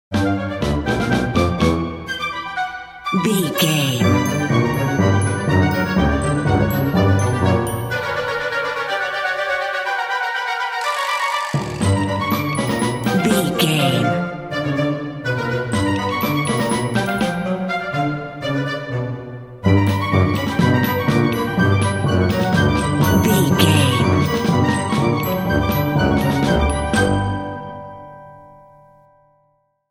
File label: zany overture